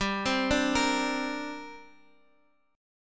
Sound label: D 7th Suspended 4th Sharp 5th, G